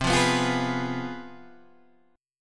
C Major 7th Suspended 4th Sharp 5th, C, down, Guitar, Open G